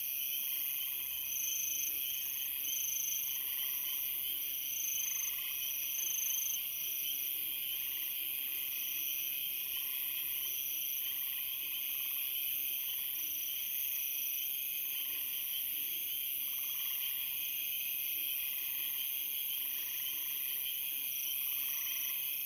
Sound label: BGM Amb bugs; game builder garage 2021 switch; Node